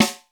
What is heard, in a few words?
BEAT KITS, S; Crypton - DrumBase5000